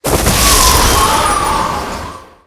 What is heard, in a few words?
Moonlight Storm bullet; nox